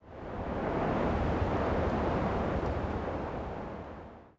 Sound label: wind CALM; The Elder Scrolls III - Morrowind; Morrowind GOTY GOG